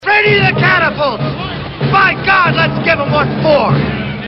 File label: Sword